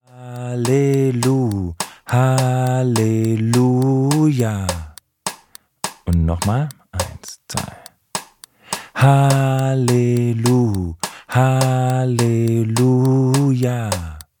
Rhythmus

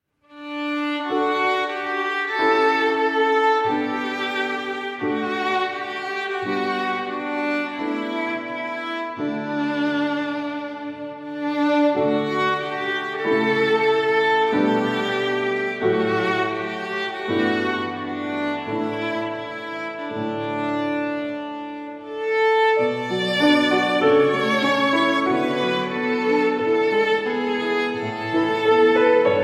Instrumental

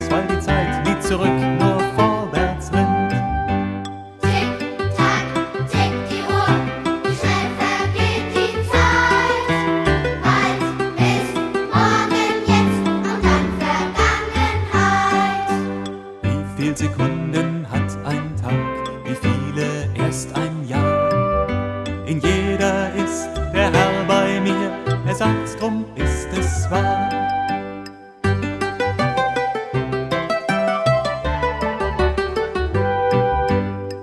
Lied Tick tack tickt die Uhr

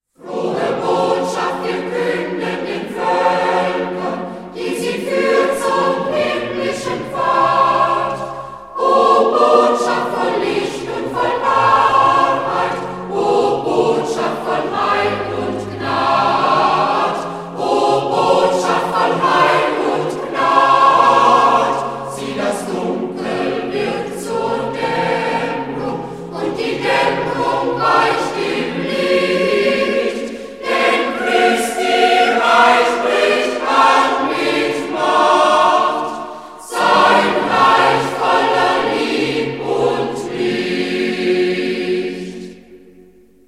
Chor

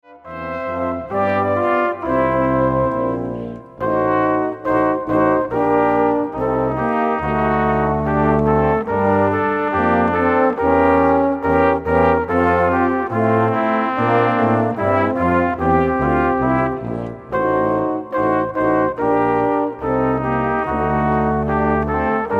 Hymne

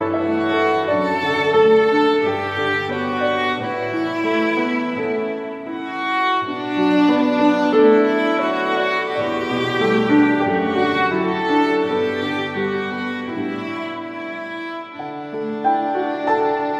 instrumental

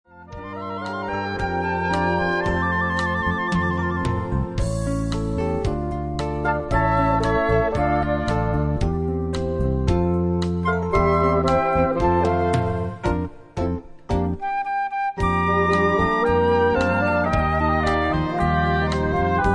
Lasset uns nun gehen Playback

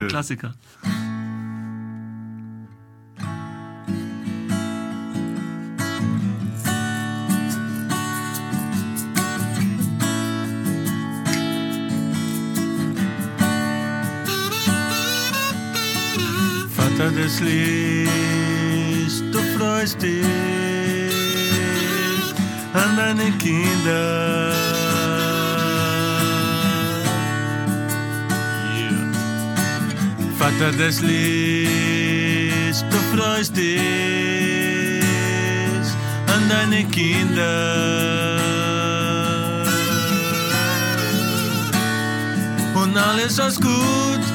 Samba

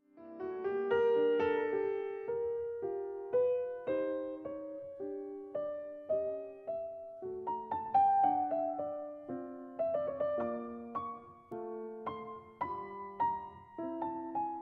Variation fuer 2 Clav Adagio

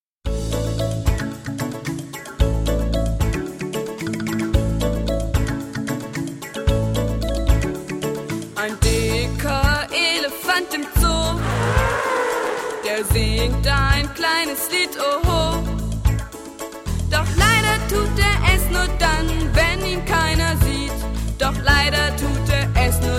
Zoo-Chor

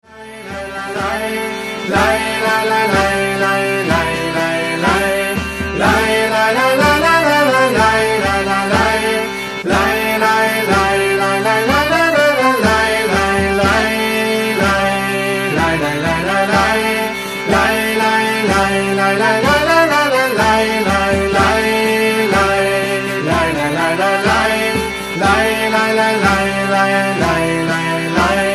Kleine Pentatonik-Melodie

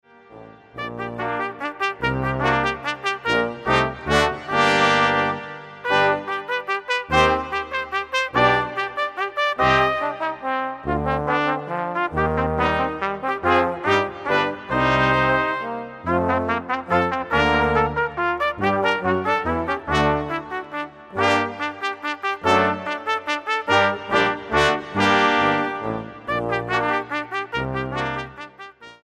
Menuett

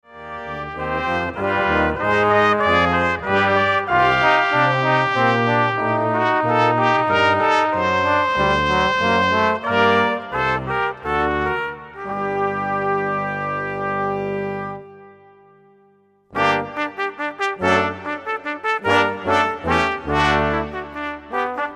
Menuett

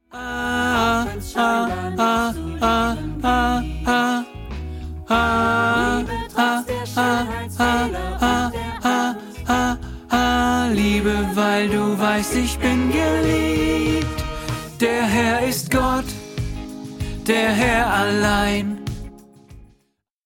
Lern-Tenor2

